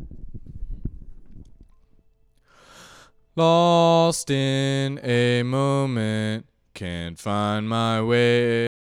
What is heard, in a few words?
Male Ambient Lead Voc